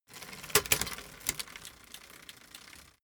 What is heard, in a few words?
bicycle street gear down